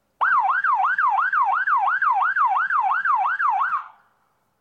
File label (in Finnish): poliisi